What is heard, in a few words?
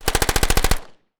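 9mm exterior shots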